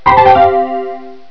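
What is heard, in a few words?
honky tonk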